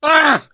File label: hurt critical, survivor voice